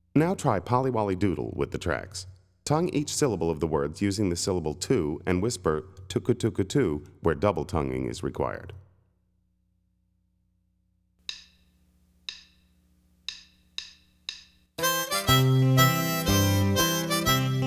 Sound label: Polly Wolly Doodle, Harmonica; Play Harmonica